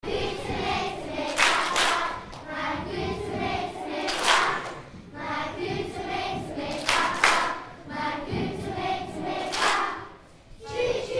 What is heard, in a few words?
choir